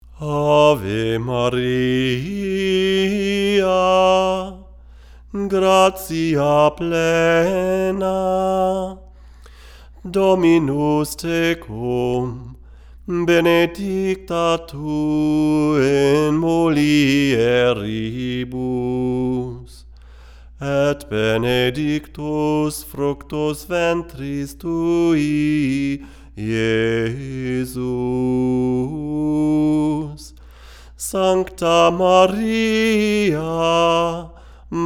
Chant; Rode NT1-A Microphone

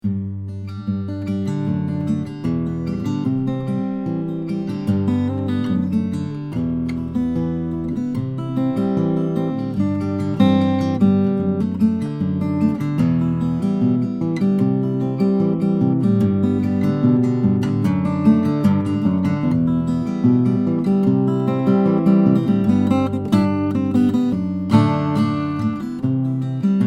Fingerstyle; Krimmel; Showing fingerstyle sound, no pick; Blue Dragonfly mics; Presonus ADL 600 preamp; Rosetta 200 A/D converter

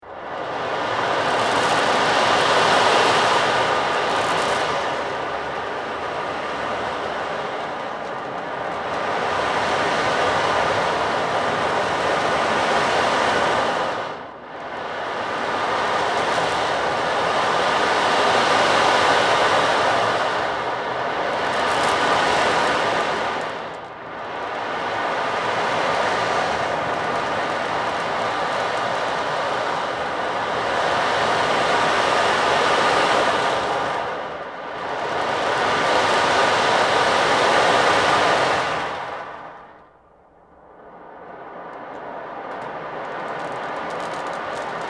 Rain Drum; percussion; Neumann KM84

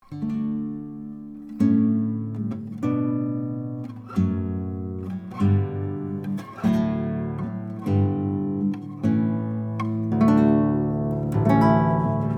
Canon; Sakurai; Canon in D / (Pachelbel)

